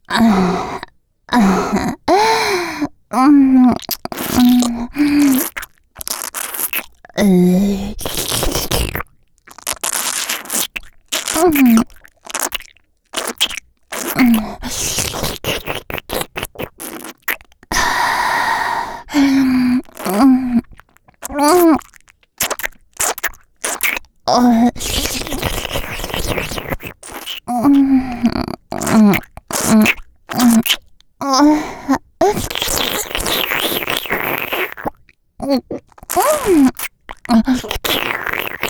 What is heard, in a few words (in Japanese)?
フェラ; ギャルフェラッ!!学校は援交ギャル風俗店ッ!!